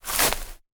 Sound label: grass footstep